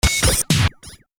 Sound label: stereo metallic ricochet; sound ideas